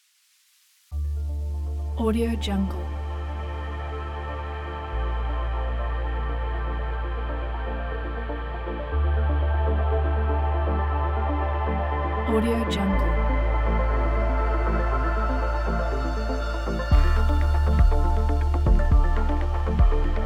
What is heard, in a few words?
ambient cosmic soundscape